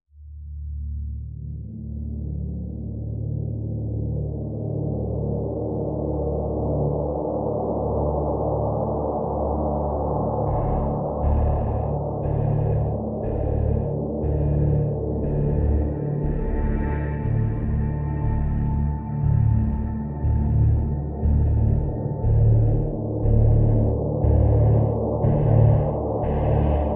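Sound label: Drone Wind Farm D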